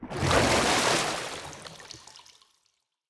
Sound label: Victory Monster Emerge; Susano; Smite